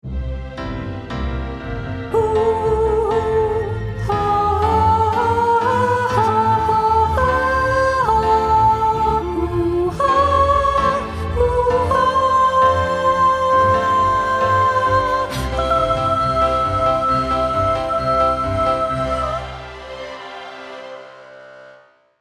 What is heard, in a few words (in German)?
Sei hier Gast Sopran